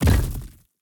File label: footsteps; smite; atlas